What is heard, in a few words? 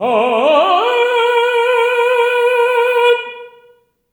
TENARP A#3, tenor arp, voices